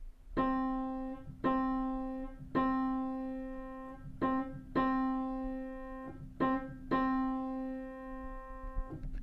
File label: cymbals; cymbals crash bang